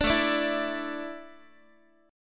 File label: A Suspended 4th, Guitar; Asus4; strummed